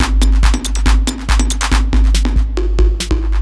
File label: hard beats 140, hard trance beats